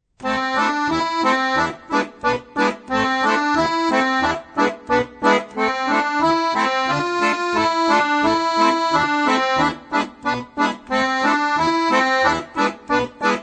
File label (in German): Die Moll-Tonleiter, Akkordeon; Tanz in A-Moll; Akkordeon Workshop Band 1